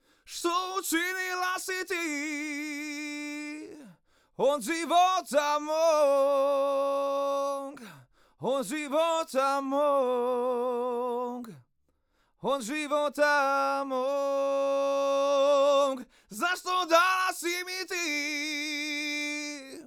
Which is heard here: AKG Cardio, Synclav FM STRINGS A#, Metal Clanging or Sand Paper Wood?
AKG Cardio